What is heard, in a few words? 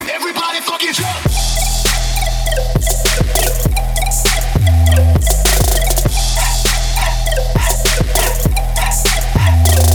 Twerk Remix